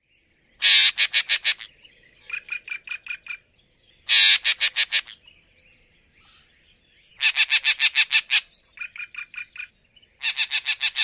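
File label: harsh calls and piping notes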